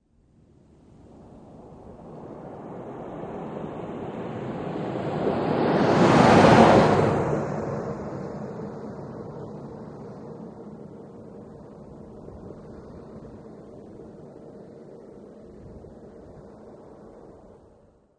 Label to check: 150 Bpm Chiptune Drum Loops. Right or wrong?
Wrong